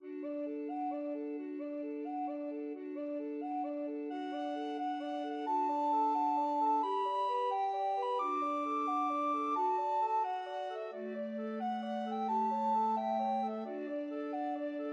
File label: recorder ensemble